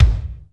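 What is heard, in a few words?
kick, Fast Tekno, Roland; Northstar - Drumscapes Roland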